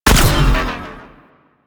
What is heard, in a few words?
Space Fighter Elite Laser Fire; Space Fighter Laser Agent